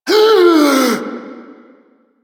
friendly Grineer revive complete gasp; Warframe; Veilbreaker; Quests; Kahl

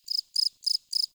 Forest Open Glade Cricket Close, Day; Ambience

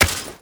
Step Dirt, Footsteps

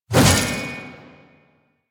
Shield Whoosh; Warframe; Duviri; Duviri Dax; Weapons; Duviri Mace And Shield

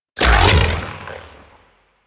Shotgun Shot; Weapons; Revenant; Warframe; Tenno

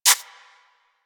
Rodent Step Dirt; Characters; Foley; Warframe

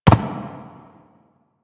Ram Step Run Dirt; Animals; DE; Warframe; Quests; Duviri

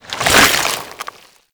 Infested Bone Blade Mouth Foley; Warframe

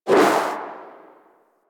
Exit Possession; Warframe; Gadgets

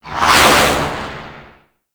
Space Pod Random Thrust E, Vehicles; Grineer; Warframe

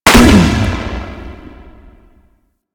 Skiff Missile Explosion; Speeder

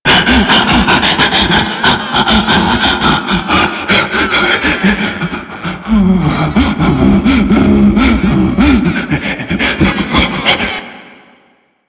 Ape Prelate Cower Loop; Enemies; Warframe; Proto Infested

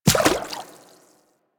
Inf Medium Run Water, Infested Footsteps; Warframe

